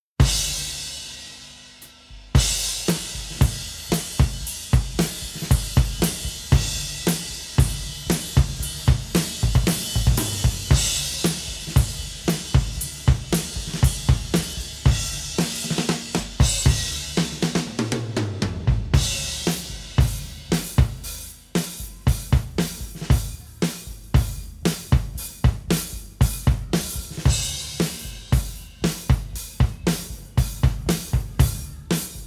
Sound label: drums